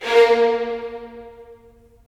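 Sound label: strings violins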